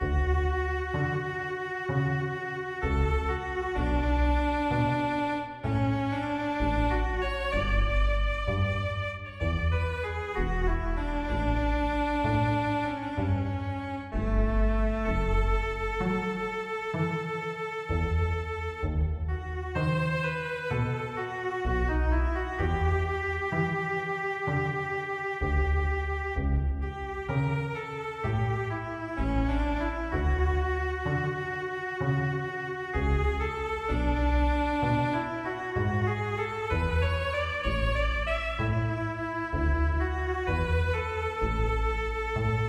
A Short Piece of Violin; Growlanser; PS1

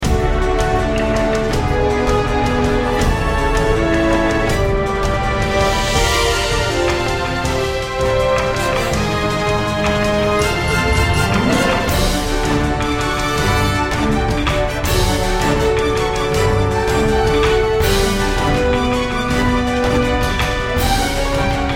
Joyful - Fanfare

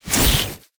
Magic; mighty party 2017 gamerip pc ios android; SFX Fight Magic Green Ball

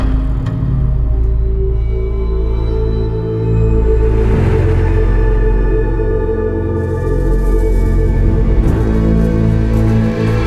Amb Day Music; Fortnite; Fortnitemares

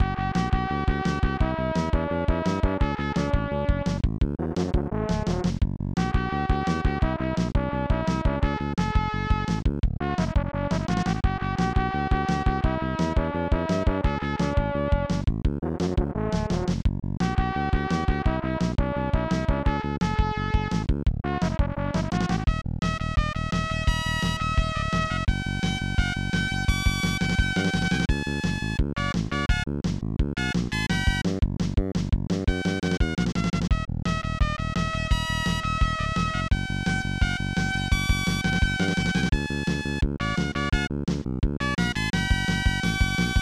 Level BGM; super widget; snes

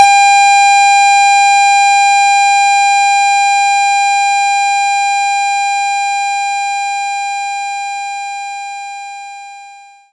Square Wave

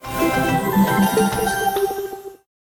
Level Start; Aquascapes Collector's Edition

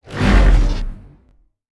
Fight Damage Low; mighty party 2017 gamerip pc ios android

